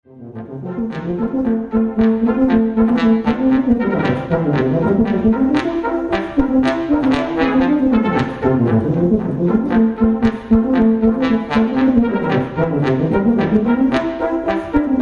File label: 2 Klarinetten